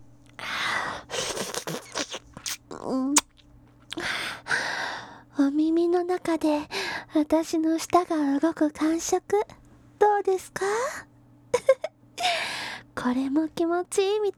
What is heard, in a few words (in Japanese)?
お耳の中で私の舌が動く感触; 特典エロ台詞